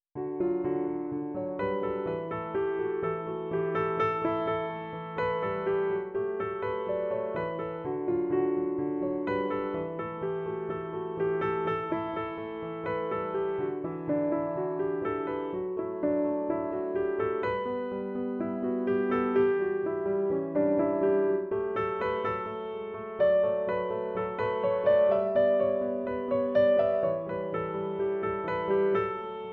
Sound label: Pokemon Center Backing Track; Piano; Pokemon Red / Blue